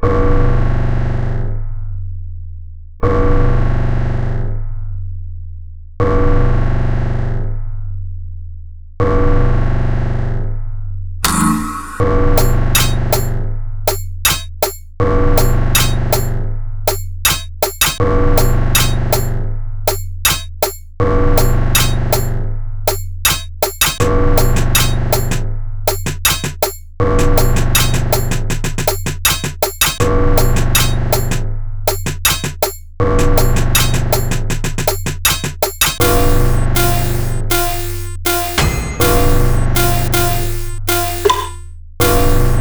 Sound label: PERC